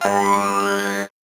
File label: VocoBit Noise, 8-bit; musicradar; 8-bit bonanza